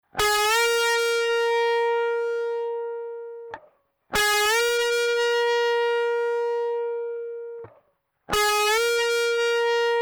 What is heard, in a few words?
preamp only output channel 3; Subharmonic Rumble of Channel 3 and 4